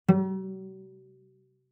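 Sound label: Cello pizz fortissimo sul G Gb3; MIS Pitches - 2014; Strings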